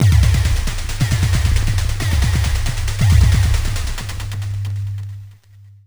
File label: Techno Klub; Zero-G; Soundclash Nu Dance Electro; Acidized Wavs; FX Atmospheres